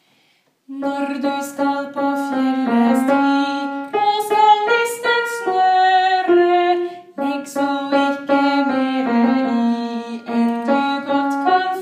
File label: Fjeldesti ALT